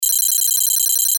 Homing Software Bleeps; Beeps; Multimedia; Blastwave

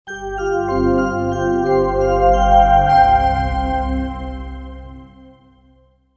Startup, Computer: Short Musical Style Phrase

